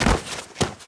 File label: bodyfall stone, foley